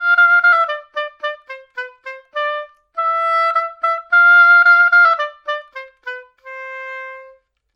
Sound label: Oboe